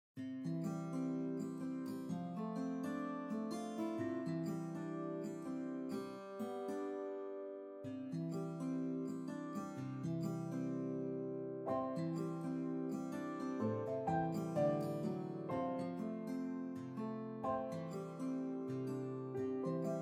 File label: Backing track; Morning Routine; C instruments